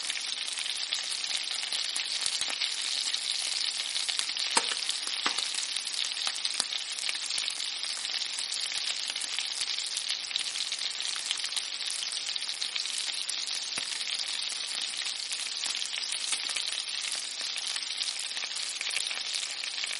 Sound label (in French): boucle cuisson